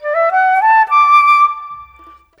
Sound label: Flute, Orchestral Series Rock and Pop